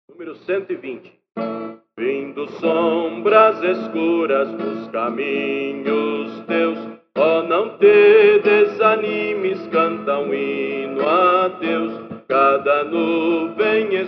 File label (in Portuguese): CORO EM PAZ